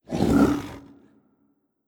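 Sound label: Huntress Run Tiger voice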